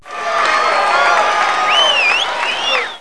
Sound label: goal; mister foot